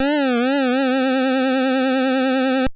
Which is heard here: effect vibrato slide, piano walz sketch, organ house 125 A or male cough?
effect vibrato slide